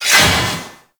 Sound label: Proc Slash; Warframe; Mods